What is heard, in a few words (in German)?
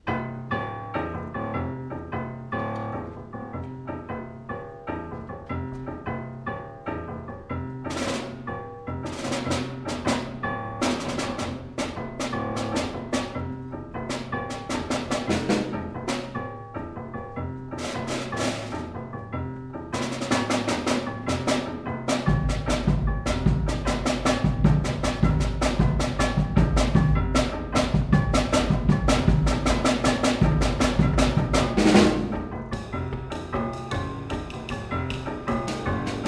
5er mit snare